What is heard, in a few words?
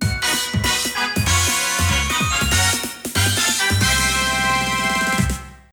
Cooking Jingle; SwSh; Unused